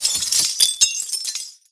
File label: glass fall; STALKER; IWP